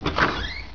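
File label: wood open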